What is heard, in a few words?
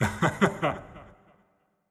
Laugh; Marv